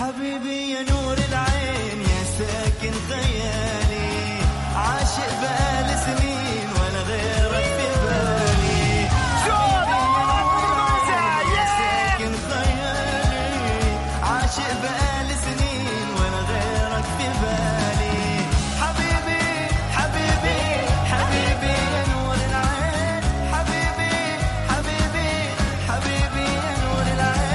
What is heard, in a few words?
arabic naat islamic